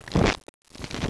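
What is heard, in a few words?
shuffle, cards; Seahaven Towers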